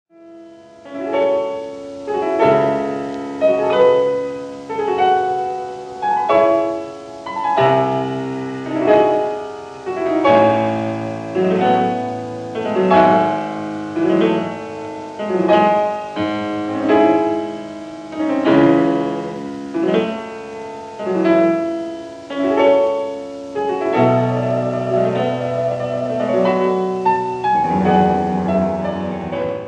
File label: Etudes for piano Variation; Schumann; Symphonic; Op. 13